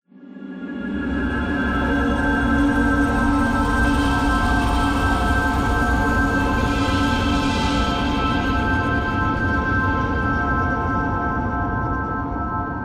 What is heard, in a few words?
amb unique headcrabs; Half Life Alyx